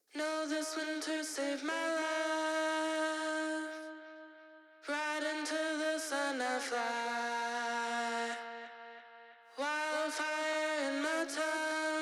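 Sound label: Disto Vox COLOR COPY Stereo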